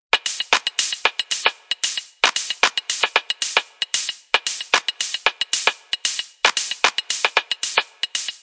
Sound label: Northern Comfort Found Percussion, 114 bpm; 074 to 128 bpm; DRUM LOOPS and BREAKS